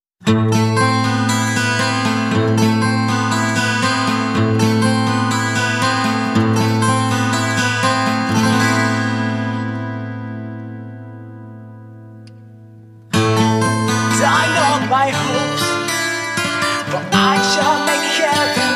acoustic guitar version

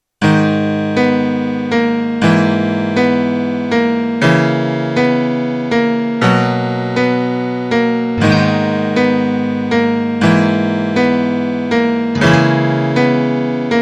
piano cover

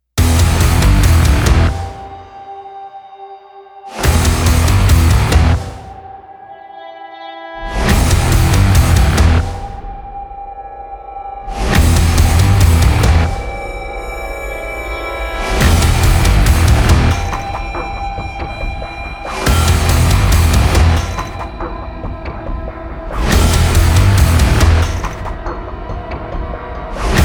no choir

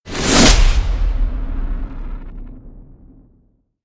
hit shred

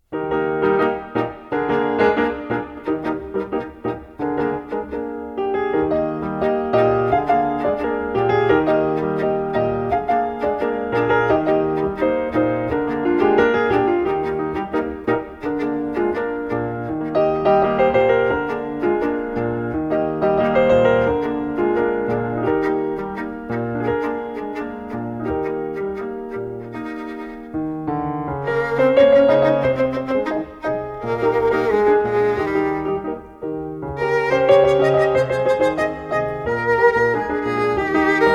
piano and violin

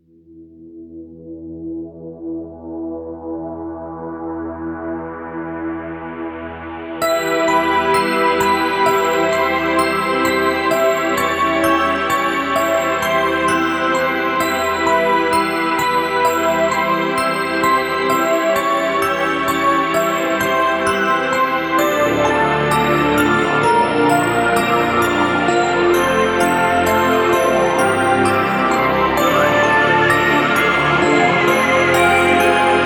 carillon

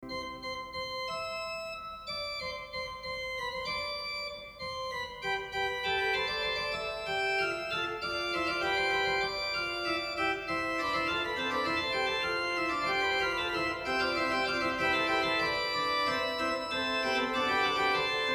fugue in c major; johann sebastian bach; prelude and fugue; bwv 553

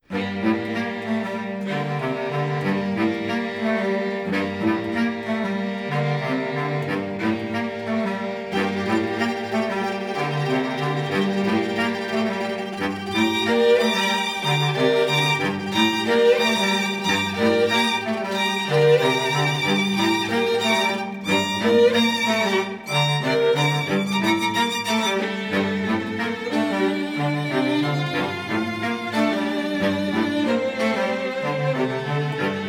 bass clarinet and string quartet

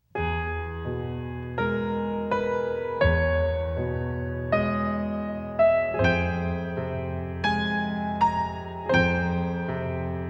piano bridge

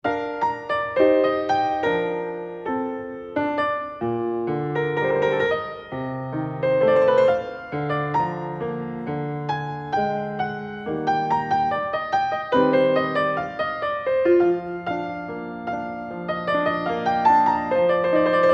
piano sonata in F major; mozart; piano sonata no 12; k 332

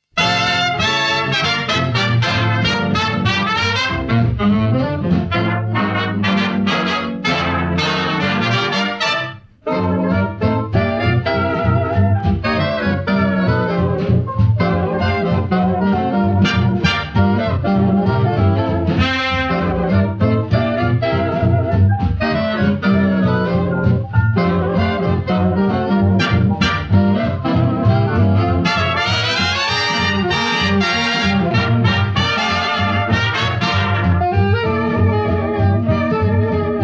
orchestra

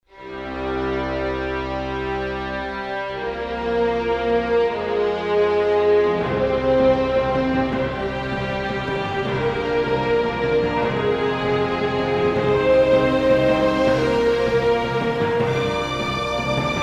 orchestral only; immediate music

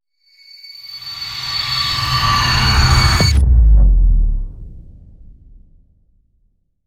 hit swell; Ninja Tracks; scorpion

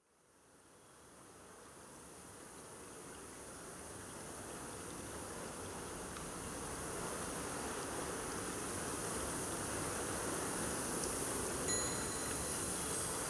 late crickets

